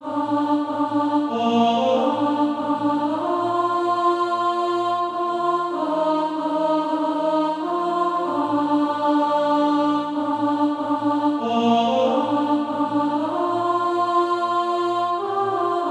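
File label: alto, Practise Music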